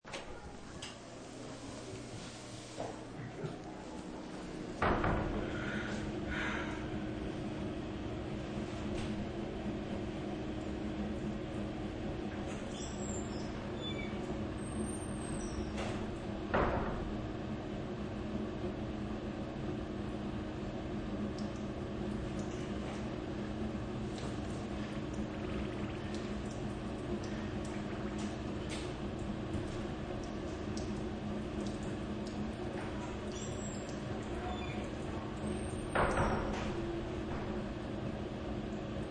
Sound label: Restroom, Public restroom ambience roomtone, various door opening and closing sounds and fan; People urinating and water faucet being used; Human; fan noises